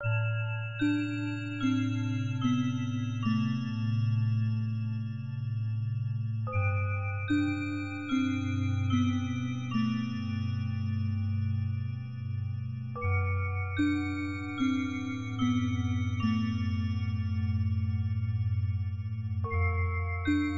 song slow

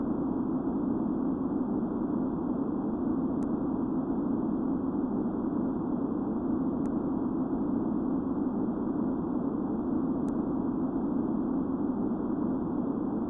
music wind

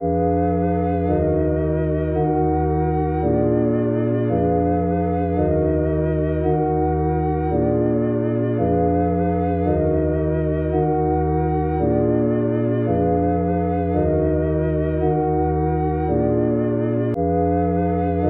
Flute

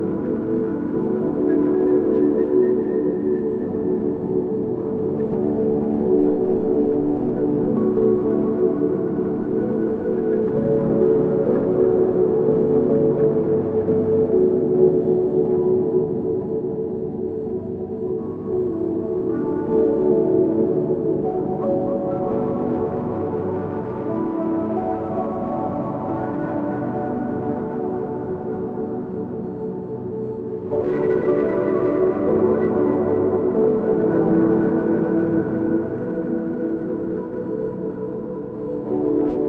light loop; Yume 2kki

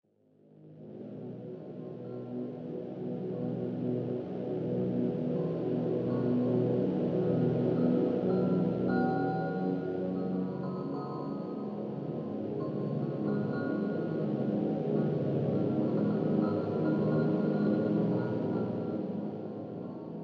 CHIMES

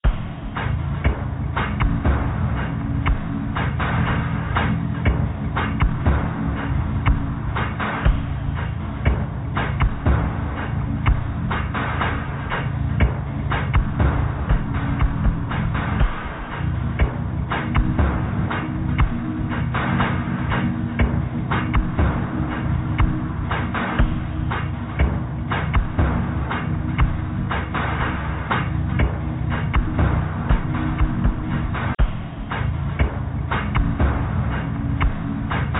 machinery

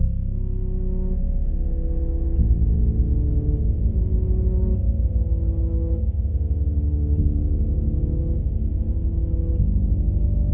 Bgm slow

